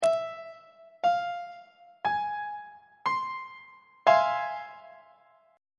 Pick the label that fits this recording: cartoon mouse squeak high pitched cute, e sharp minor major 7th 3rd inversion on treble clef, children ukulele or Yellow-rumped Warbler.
e sharp minor major 7th 3rd inversion on treble clef